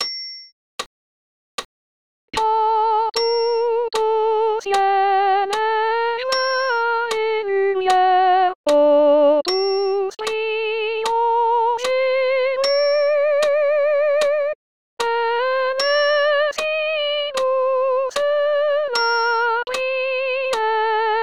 voix haute

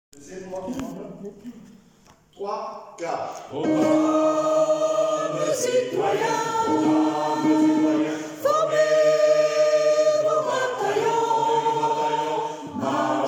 refrain de la Marseillaise tutti

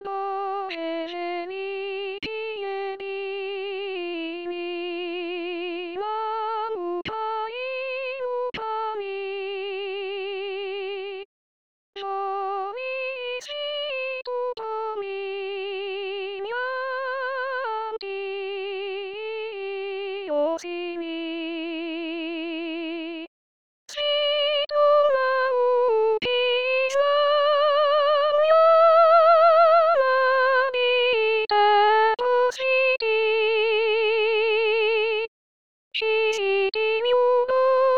voix Soprane

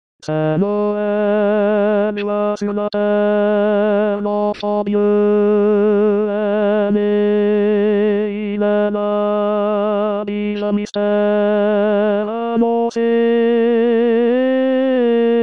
Ténor; AMAZING GRACE

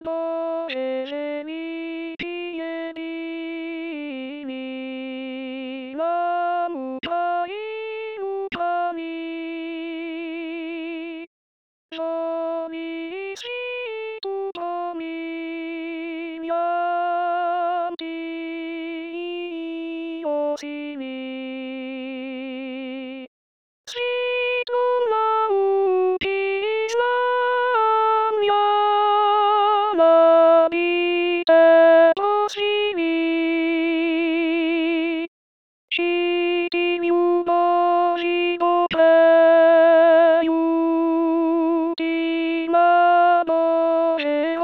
Alti voix alto

